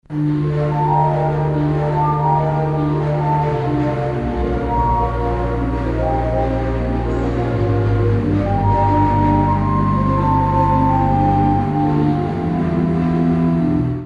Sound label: Bourdon